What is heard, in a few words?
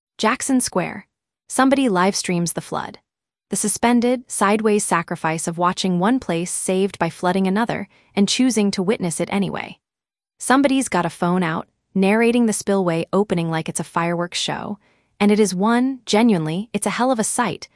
crowd reversed; the spillway crowd; louisiana